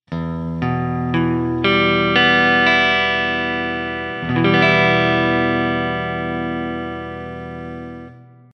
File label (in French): Mi mineur